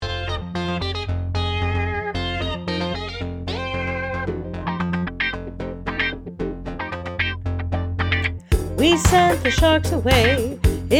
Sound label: vocals